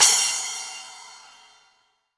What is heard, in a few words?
cymbal, Crash Cymbal, mono crash; AKAI S6000 CD-ROM - Volume 3